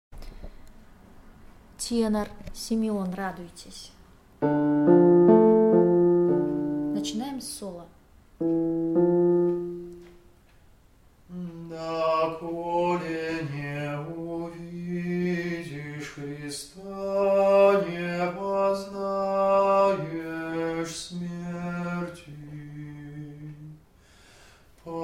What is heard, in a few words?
tenor; Simeon